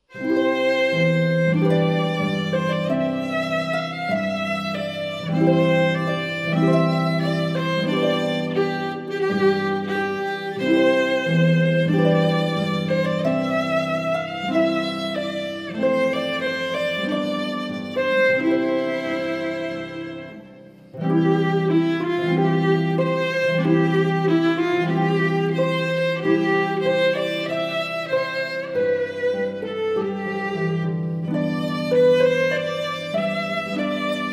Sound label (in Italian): arpa violino; Clarke; Marcia nuziale; matrimoni